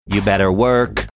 singing

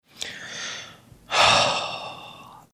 heavy sigh

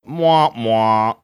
mwah mwah

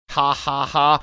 hahaha

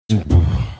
funny sound

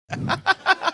short laugh

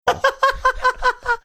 cute laugh

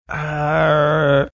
making funny sound

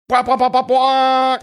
making air horn sounds

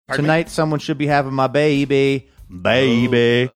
singing tonight someone should be having my baby

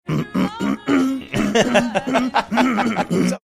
clearing his throat; to donors of the day song

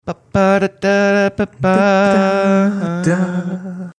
singing portion of mystery song

